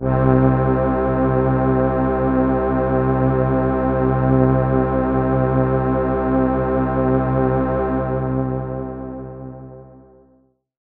pad C2, Oberheim OB-Xa

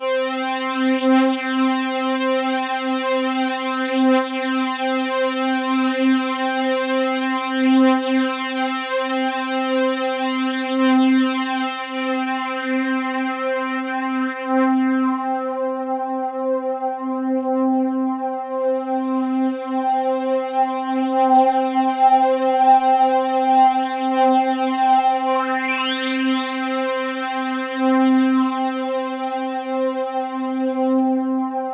C3, Roland JV 2080 CLUB ORGAN

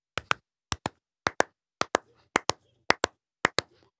African Vocal Clicks; Continental Drift Aftershocks; Vocals

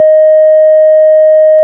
ORG, Farfisa; Roland LCDP09 Keys of the 60s and 70s 1; Farfisa Flts; Farflt DBars

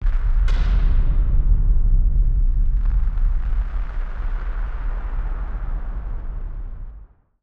Firework FX; musicradar; bomb factory

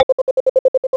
HOP, Anime Sound FX; COMIC

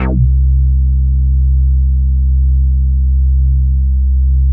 C3, Bass; Distored Reality 1&2

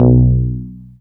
BAS TX-802; Best Service Gigapack 2

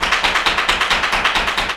JALEO TAP, Flamenco

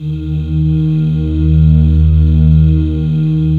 PAD D50; Pads

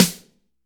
SNR MARCH; Roland - LA Composer vol. 1; STANDARD PRC

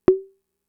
Conga, Fricke MFB-522

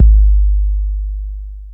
TR-808 Kick

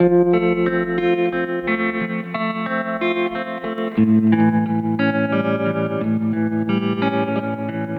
Trem Guitar, 70s Analog Funk

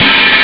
d50 hihat open; steel; Amiga Soundtracker Sample Packs

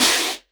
Elektron Monomachine, Drum Machines

